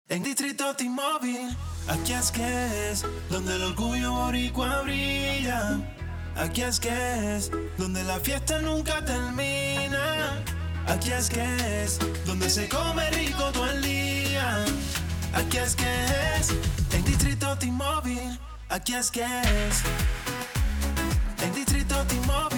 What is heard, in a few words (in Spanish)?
jingle